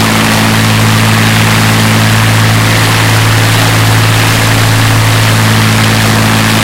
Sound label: spitfire side, engines; War Thunder